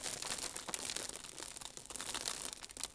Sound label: dirt; stunt rally; hit